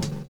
OLD SNARE; Univers Sons

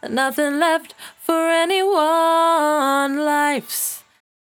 68bpm D, Velvet Vocal; Zero-G

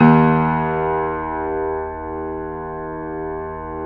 Keyboards Acoustic, D#1; USB Soundscan vol.17; AKAI

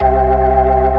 B3 C 2-4

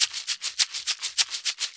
Maracas, Festa Latina, Drums & Percussion; Best Service; Festa Latina VOL-1

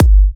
G, kicks; markus hakala kicks

